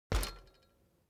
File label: transportation bicycle street bump front short